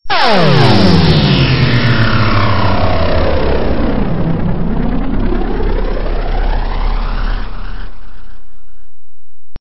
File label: Stinger; STINGERS